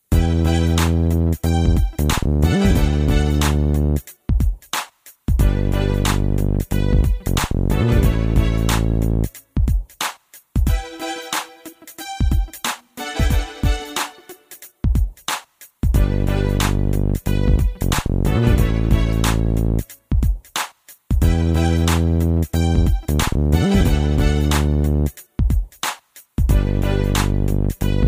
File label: Hip Hop Ringtone